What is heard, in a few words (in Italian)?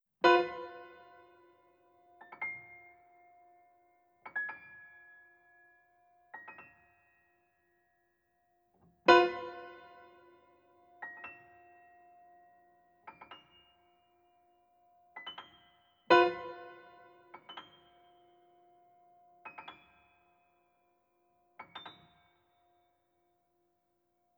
Pianoforte